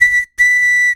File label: Choo Choo